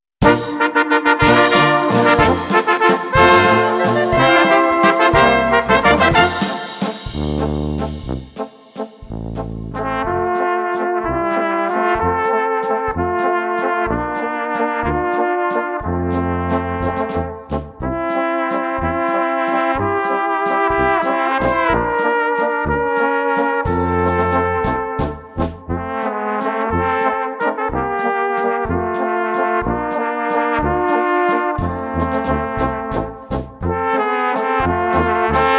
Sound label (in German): Walzer, Blaskapelle